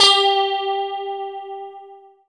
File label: GUI CLEAN, E-Guitar